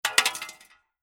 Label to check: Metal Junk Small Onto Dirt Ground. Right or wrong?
Right